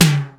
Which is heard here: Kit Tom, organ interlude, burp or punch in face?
Kit Tom